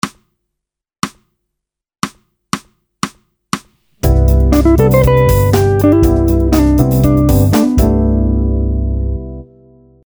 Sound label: Chord Tone and Tension